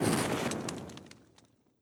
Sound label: Fire